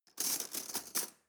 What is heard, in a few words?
cutlery teaspoon drawer handle